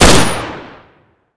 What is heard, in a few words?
shoot